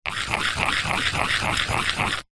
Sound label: stone small scrapes circular motion fast on rock or brick; zapsplat; foley